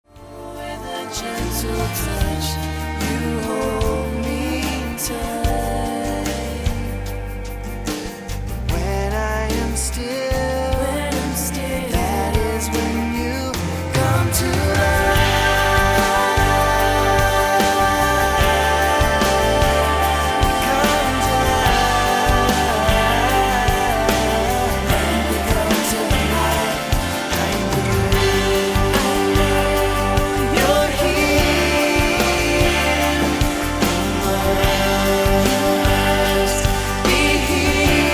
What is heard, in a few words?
Choir Of Two